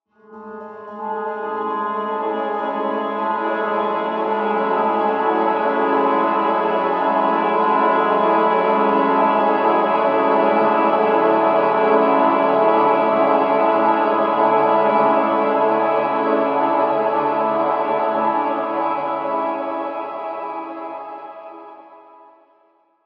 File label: piano chords wet, with airspace, hybrid reverb and delay; modeaudio